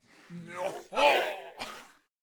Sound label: Dark Peasant Attack Vocals